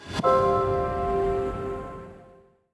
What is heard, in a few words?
stinger gift unwrap; ui; stingers; dota